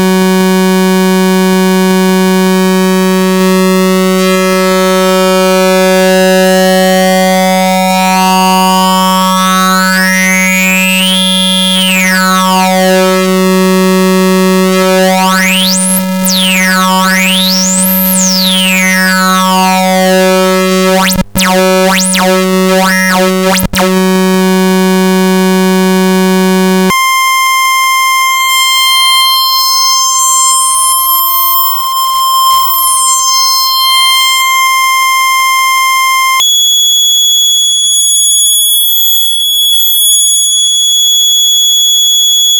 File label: pulser